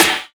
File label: snare brite; Sonic Foundry (Sony Creative Software); Ugly Remnants VOL-2; Beatstuff